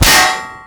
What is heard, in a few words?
metal solid impact bullet; physics